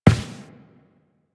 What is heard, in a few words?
Step Default, Footsteps Walk; Warframe; Tenno